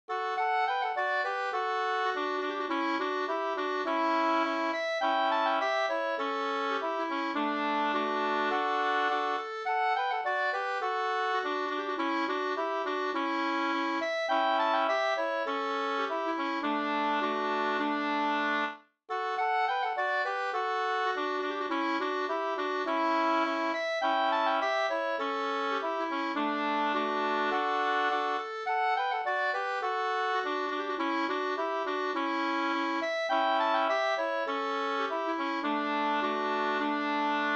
brudmarsch; Silvbergs brudmarsch

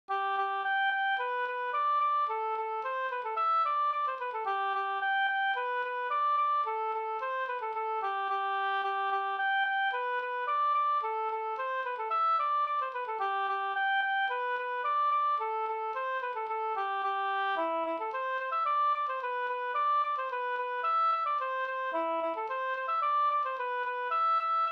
polka

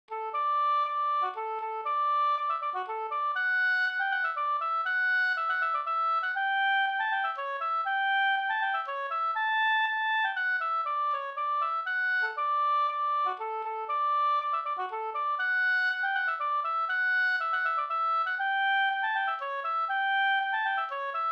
polska Bodapolska

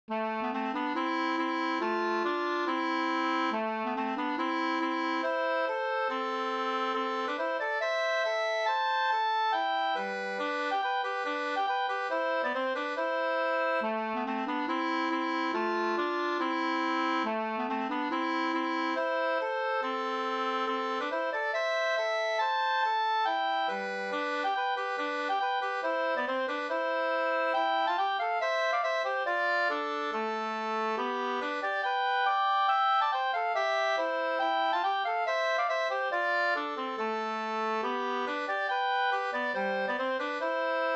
schottis; Morfars schottis Nb 2st

